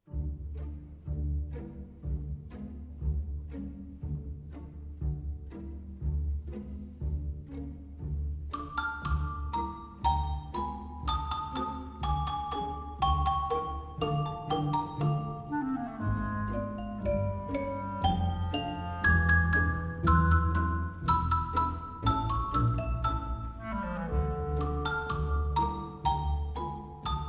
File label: piano